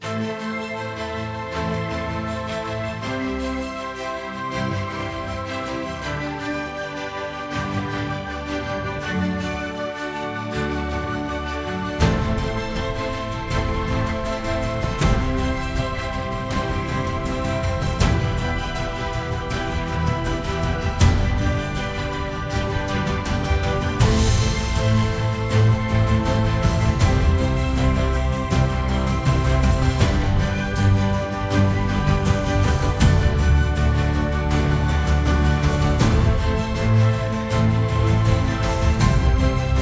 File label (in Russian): Фоновая музыка